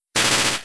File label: thundershock, Battle Effects; P3D-Legacy